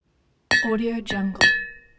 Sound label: glass bottle clinking